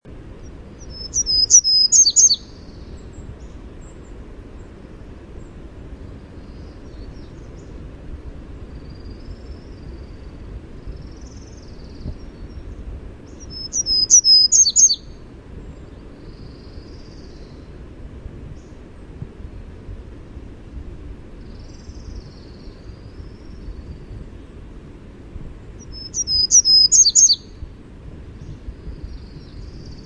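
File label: Townsend's Warbler song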